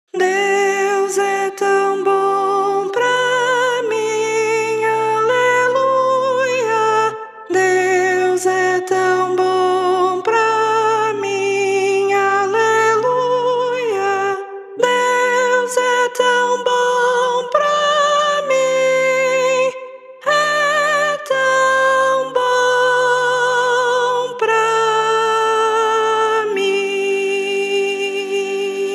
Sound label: soprano